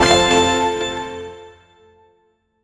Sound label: success sound